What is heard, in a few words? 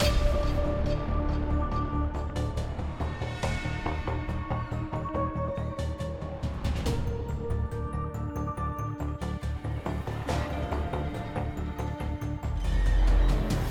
Mood Mysterious Heavy